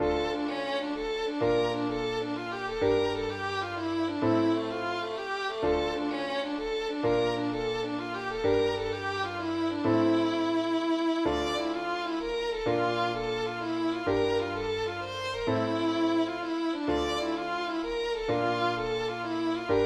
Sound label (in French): mazurka